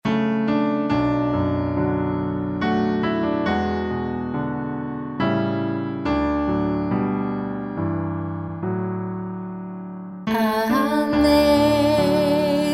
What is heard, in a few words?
vocal; Amazing Grace